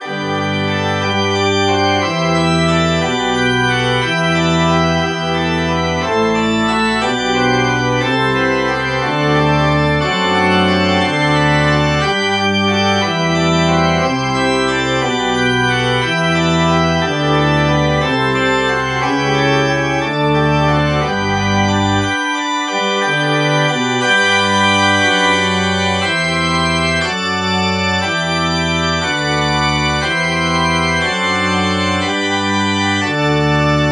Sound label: church organ